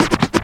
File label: Vinyl FX and Scratches, FX Scratch